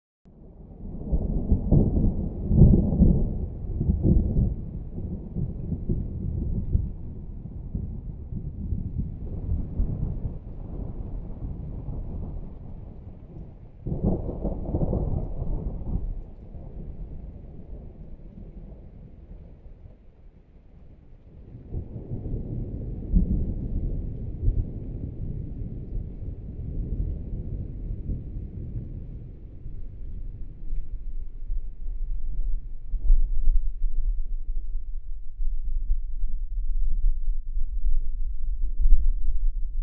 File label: very far, nature; very far2; Atmospherics